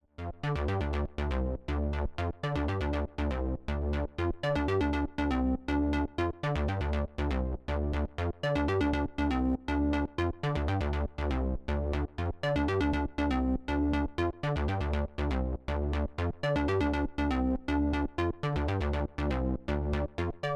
Mix as Crossfader; dreadbox Erebus V3

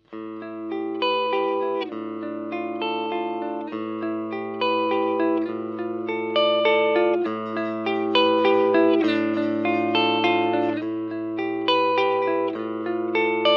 Ibanez MH 400 mid clean

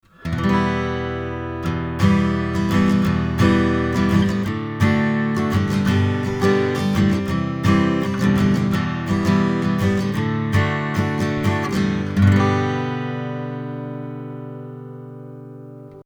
AcousticGit Ribbon 300Hz 10dB 7kHz 4dB Shelf; Fredenstein Artistic EQ

